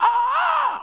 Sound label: "ah ah"; Voice; ooh ahh